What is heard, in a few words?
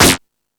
Found Sound, Drum Machines